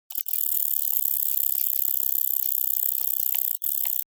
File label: courtship purring